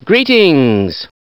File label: attack; slender; svencoop